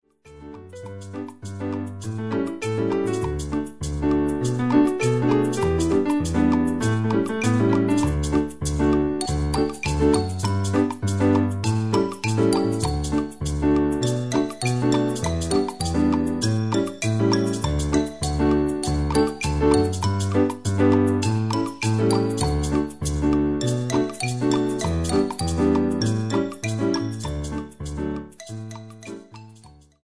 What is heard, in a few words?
instrumental